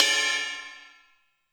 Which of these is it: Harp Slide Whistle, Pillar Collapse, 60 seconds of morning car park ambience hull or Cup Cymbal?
Cup Cymbal